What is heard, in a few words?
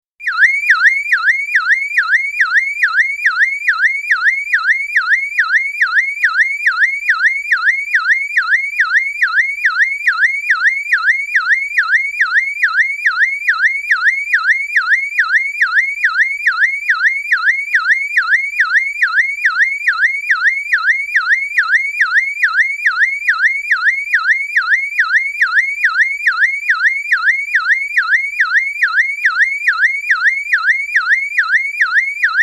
Exterior - continuous alarm sounding, close perspective (Peugeot 205GT); Car; BBC